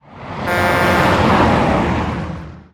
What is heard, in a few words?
shop new items